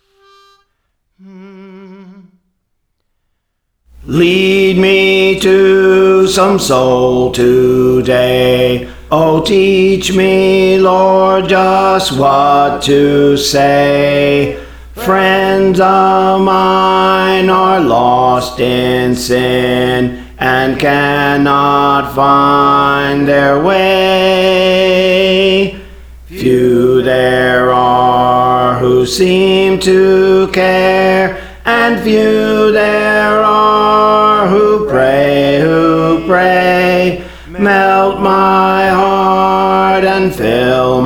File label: Bass, Songs; Praise For The Lord Training; Lead Me to Some Soul Today